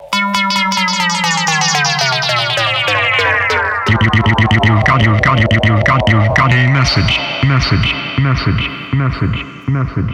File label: Electro Message